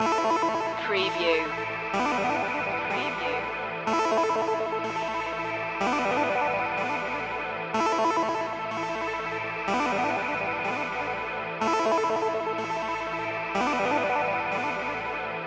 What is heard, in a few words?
8 bit skyway loop